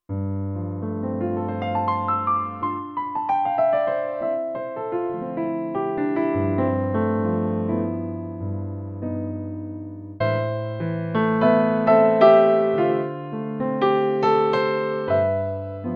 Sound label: Fly